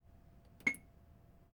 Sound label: slot machine